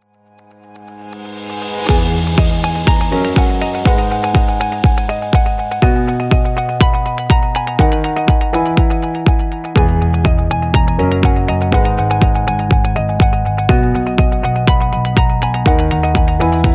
Technology Corporate; corporate promo

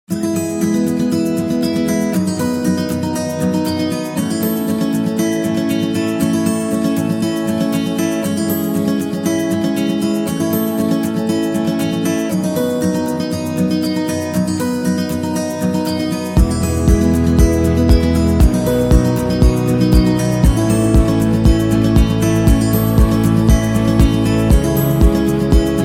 Guitar Folk; happy music vol 8

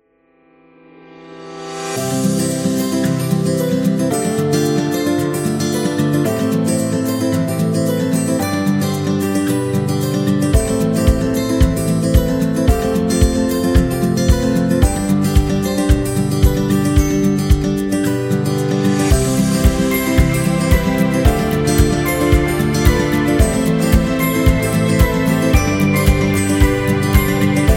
Positive Vibe

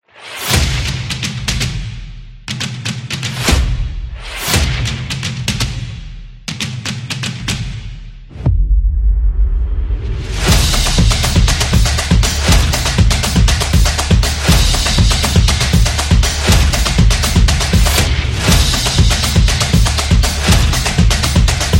Intro Upbeat, energetic promo

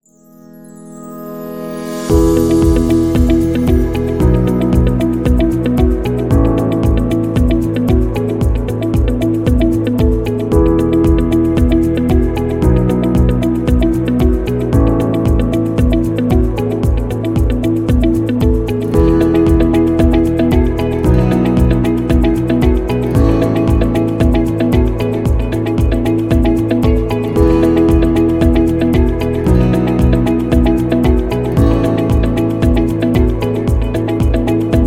Corporation; corporate music vol 8

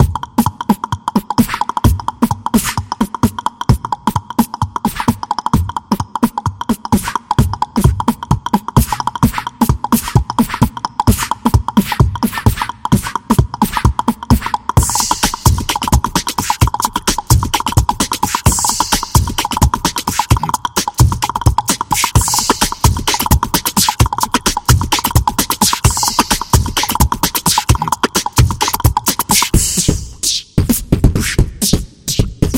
A Beatbox; drumming action

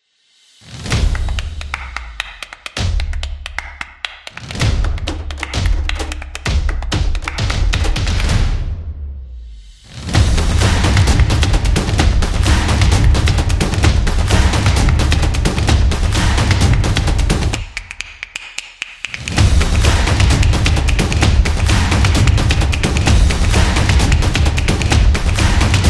This Is Claps; football promo

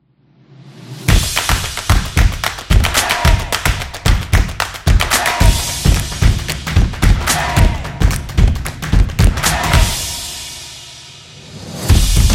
Energetic Drums

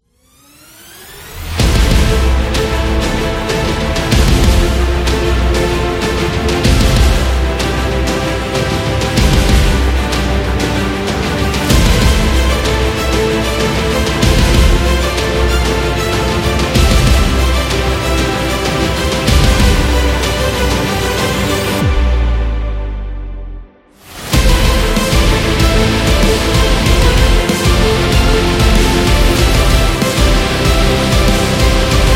Epic Metal Trailer

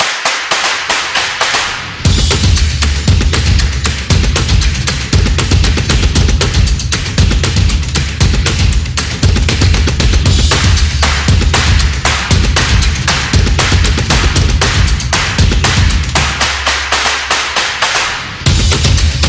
Clap Happy, energetic promo

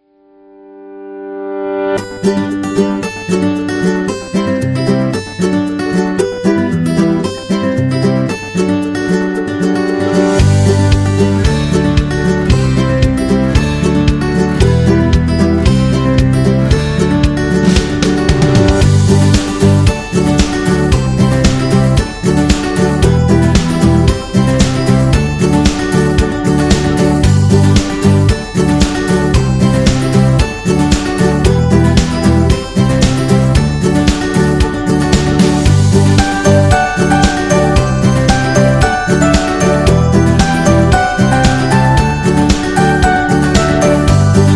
Positive Guitars, happy music; happy music vol 2

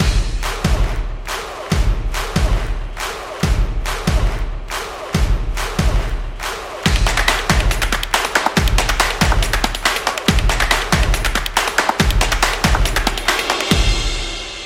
Drums Action; sports

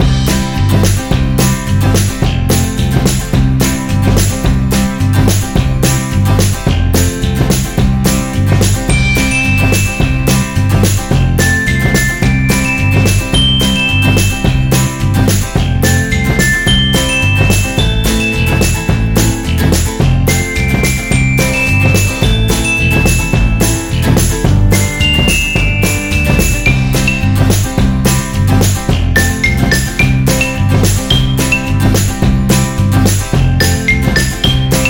Feeling Happy, happy music

